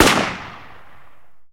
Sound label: rifle shot; War Thunder; guns; npc